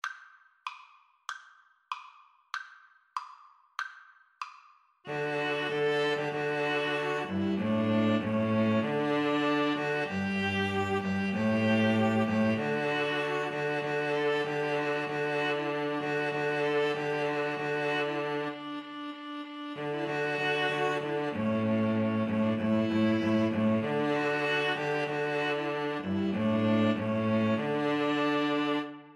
string trio

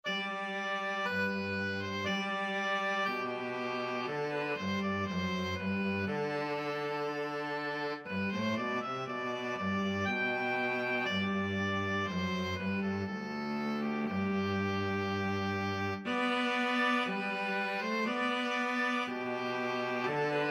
handel conquering hero, oboe cello duet

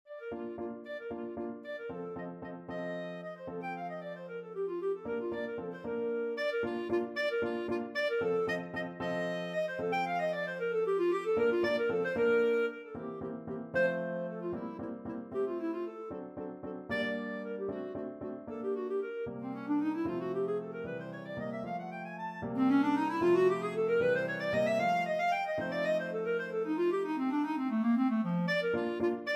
sonatina, clarinet; kuhlau

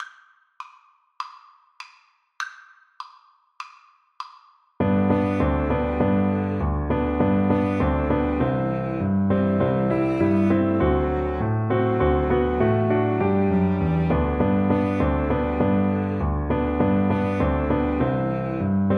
jingle bells, Piano Trio

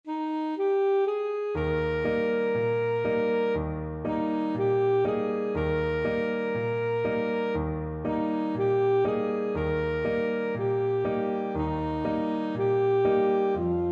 saxophone

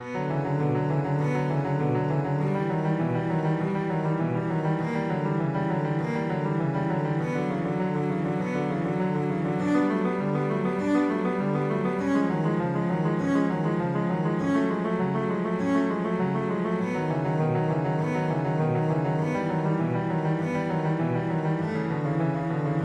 bach prelude c minor, cello

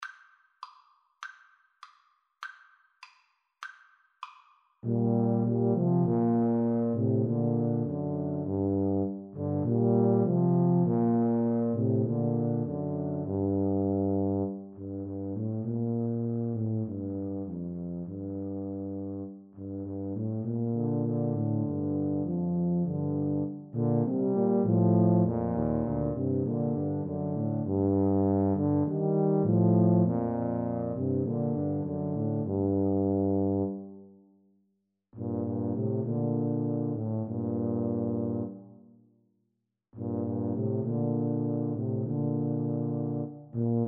crown roses, tuba trio

